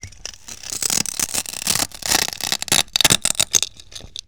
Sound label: painful stretching; Sound Design - Horror; Sony Pictures Sound Effects Series Volumes 1-10; Sony Creative Software